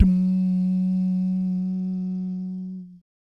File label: BASS DUMS; Spectrasonics; Vocal Planet; Jazz FX